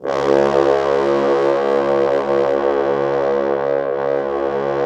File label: Orchestral Brass